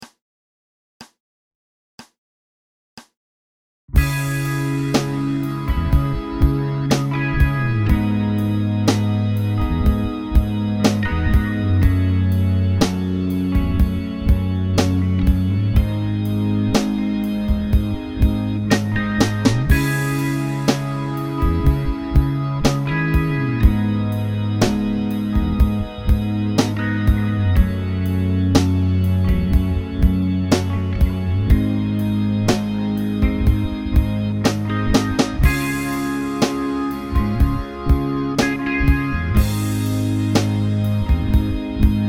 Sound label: solo